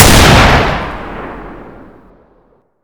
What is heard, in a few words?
scout, g2 contender; weapons